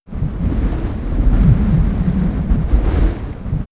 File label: Nature Sub Wind; X-Static Goldmine